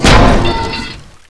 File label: garg hit